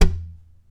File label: TAP, FLAMENCO Guitars